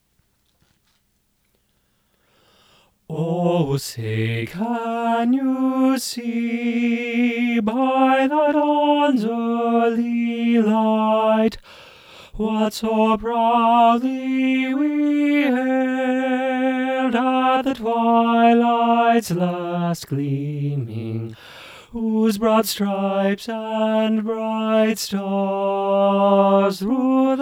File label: tenor high, choir